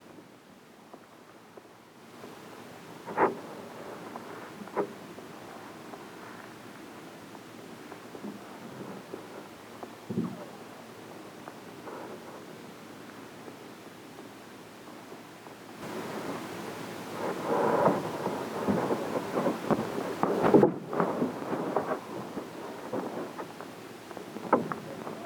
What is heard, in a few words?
Vocalizations